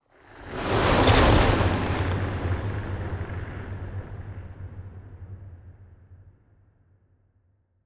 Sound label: ship battleship move; ships; Stellaris; toxoids